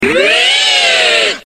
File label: wryyyyyyyyyy